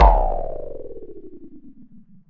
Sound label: Kick FX layer